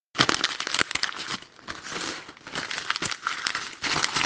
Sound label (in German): Papier